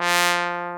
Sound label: BRASS; AKAI S-Series CD-ROM Sound Library VOL-8